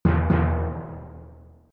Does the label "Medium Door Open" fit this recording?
No